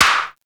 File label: Snare, Fairlight CMI III